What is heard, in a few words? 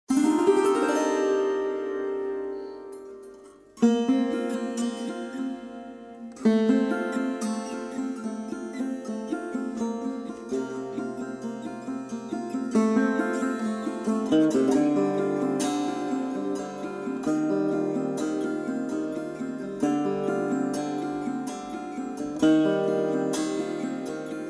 The Nobel Appears-Instrumental; kolkata; Nobel Chor